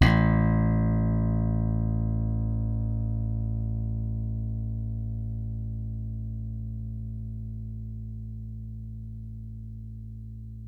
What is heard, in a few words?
pull; hi beam